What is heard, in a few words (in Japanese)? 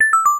キャンセル; LcdDem SFX